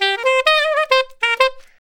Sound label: 091 W-Coast HipHop Sax Cm